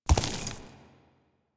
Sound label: Horse Surface Kickup Dirt; Vehicles; Duviri; Steps